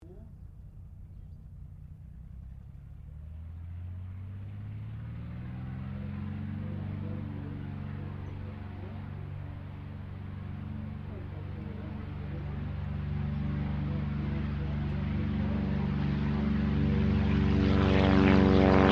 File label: AIRCRAFT CAC CA-25 WINJEEL TAKEOFF, fighter trainer; RAAF Winjeel fighter trainer; Airplanes; Vehicles